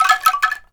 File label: ANKLUNG DO; ILIO; Synclavier Percussion 2; World & Orchestral